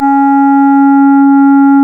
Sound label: C#, Synclav FM, WIND; Big Fish Audio